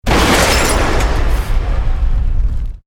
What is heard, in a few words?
EXPLOSION MEDIUM METAL GLASS